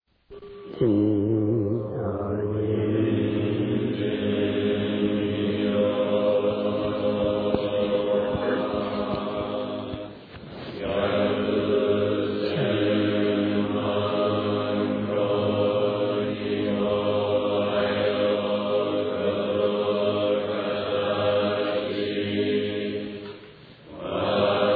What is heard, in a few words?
chant